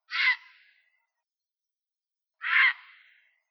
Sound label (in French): heron cendre